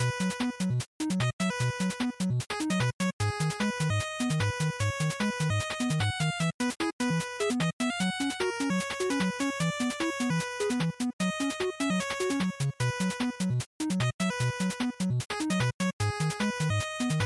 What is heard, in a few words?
NES Racing Stage Loop150